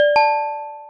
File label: chime